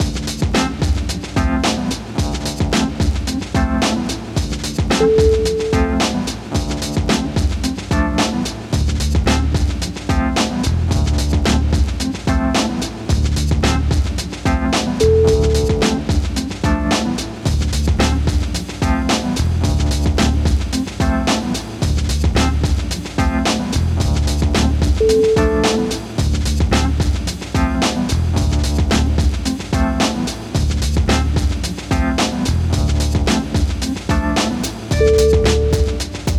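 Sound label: chill lounge, music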